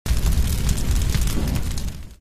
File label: banner toast; RedHotRoast; GemCrushAssets